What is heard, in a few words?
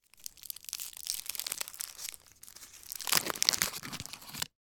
food chocolate sweet unwrapped; sound effects one